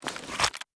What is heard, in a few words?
pistol holster, weapons; beretta; Mask's Reanimated Anomaly Arsenal